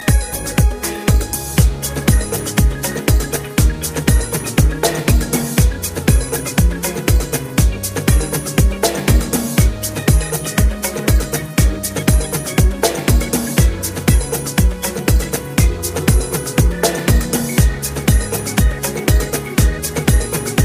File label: organ Inst